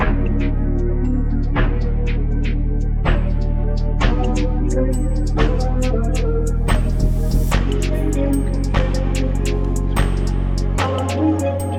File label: Acid Dreams 147bpm